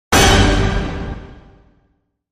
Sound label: Hit Orch Lonely Heart G